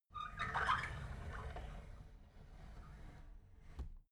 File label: drawer big squeak open soft